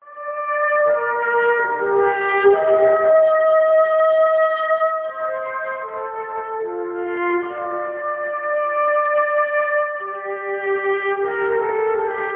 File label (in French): enregistrement trompette